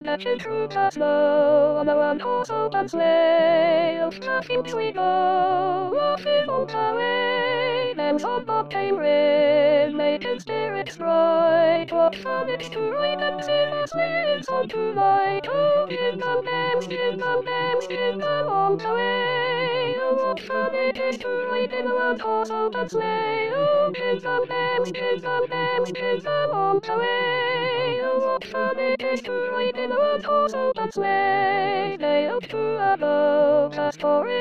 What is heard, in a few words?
Sopranes